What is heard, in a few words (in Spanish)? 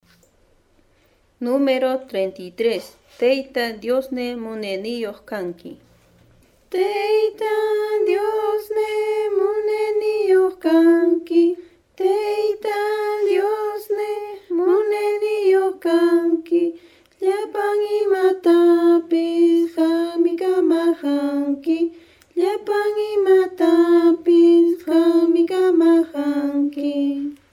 cancion